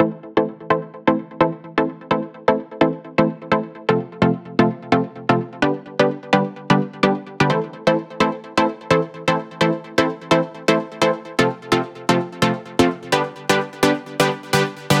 Control Synth 2 Root A, 128BPM; Vengeance Samples; Control Kit

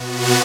Synths B; vengeance essential club sounds-3

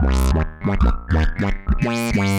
Roland F Line, Roland S100

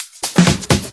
Fill Ins 128BPM; Vengeance Samples

